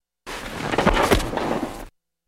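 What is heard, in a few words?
Bed Squeak Short Up Do; bed movement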